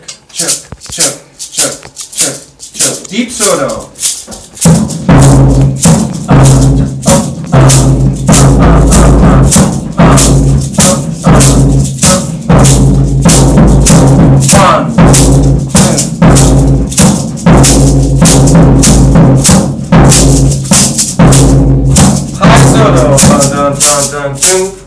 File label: samba; Deep Sudo